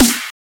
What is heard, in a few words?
snare